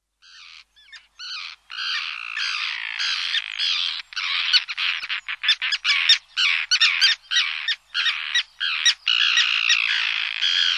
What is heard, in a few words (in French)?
Sterne pierregarin, birds